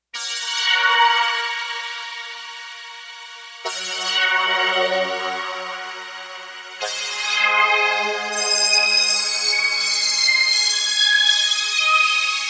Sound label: Thin Micro; Digital Synthesizer